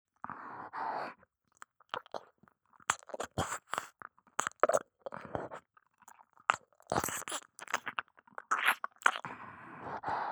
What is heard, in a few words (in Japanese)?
左接近・耳舐め・弱; リーシャ